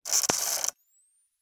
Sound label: technology camera compact take picture flash no film; pentax espio 105g; zapsplat